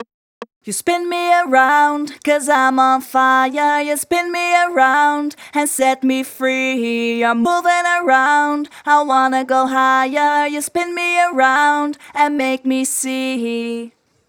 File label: Chorus RETUNE FX, 145 BPM; Vengeance Samples; Spin Me Around